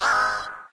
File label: nature beep; windows 9x; sound schemes